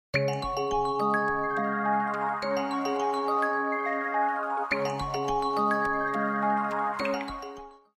Aquamarine

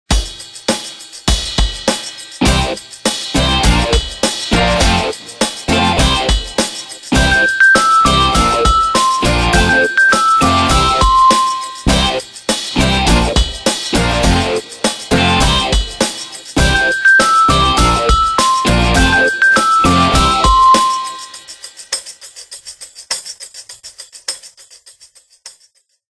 Ring Rolling Rock; Microsoft

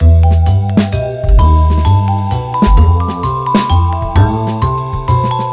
Jazzy, ringtones; Google; NewWave Labs

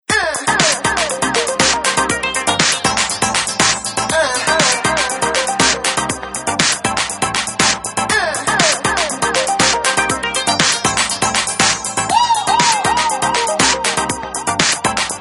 Dive M Sonic; Samsung SGH-L310

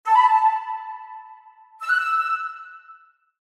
Trilled Email, Flute; HTC; Flute 1

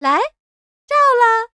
take picture now, camera; Samsung SGH-i780; china

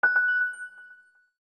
crystal unlock; LG G2 Mini

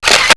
camera shutter; Samsung SGH-Z540

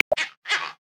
Vervet Monkey; Xiaomi Redmi-Note-8-Pro; Africa

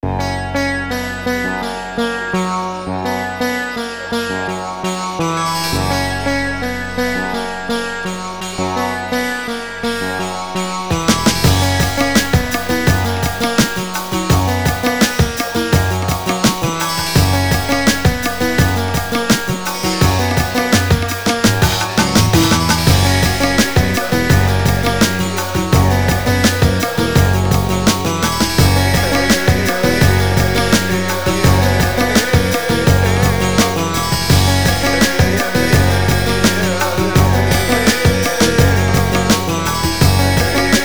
Alarm, phonetones; Sony Ericsson; PlusFourSix; Lars Edvall; Bazaar Alarm

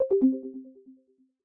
Undock; Samsung Galaxy Note 3

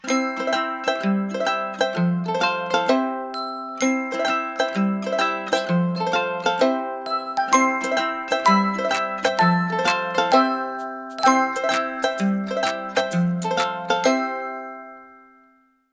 Ukulele, ringtones; LG G3 Stylus

